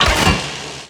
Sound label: ship designer add weapon; Stellaris